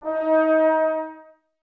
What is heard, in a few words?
BRS TBS D#, Woodwind Brass Ensemble, Brass; Miroslav Vitous - Woodwind Brass Ensemble